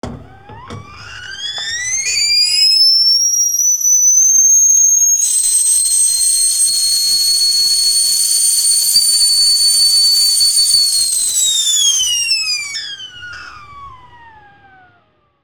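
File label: kettle; Smeshariki Cartoon Studio; Chainik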